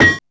M DRK M HIT, PERCUSSION

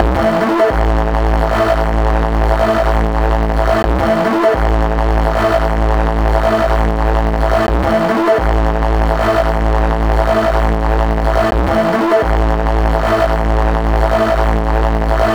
Harsh Bass; Session 07 - Electro Shock

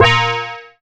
BELL-GONG, A#; Big Fish Audio

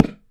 Thunder Drum

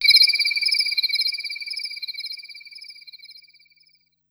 Crickets, Korg Wavestation-SR; Wave 455 - Drum Kit

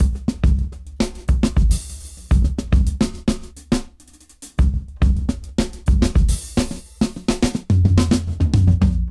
Jazz Dry 104 BPM

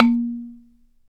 BALA, Balaphone

